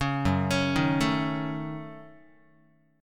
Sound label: F sharp Minor 6th F sharp Guitar; arpeggiated; JGuitar